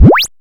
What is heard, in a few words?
Moog Zap; Quasimidi Rave-o-lution 309; dry snares